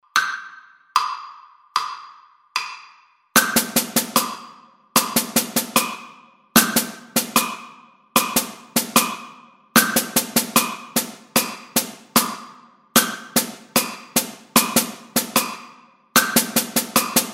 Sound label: drum set